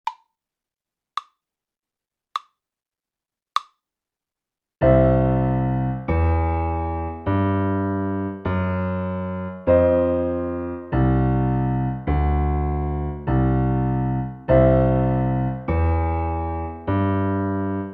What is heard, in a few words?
Blues Bass slow